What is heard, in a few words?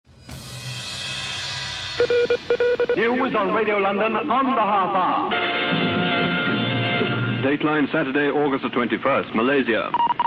Morse, pips; Ed Stewart 1430 21 August 1965, BT 27 December 1966, pips 6 August 1967